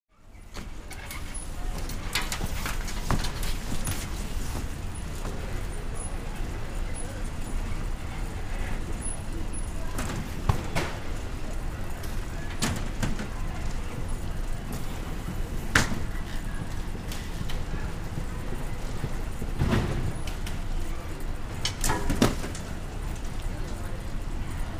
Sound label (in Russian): zvuk bagazhnoj lenty v aeroportu